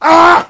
heavy pain sharp

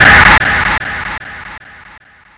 scare; slender

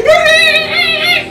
eeee

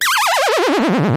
kart shrink; tf2ware ultimate; mariokart

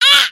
pain sharp; spyro

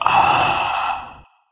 female zombie idle; nmrih; zerosiki; slender

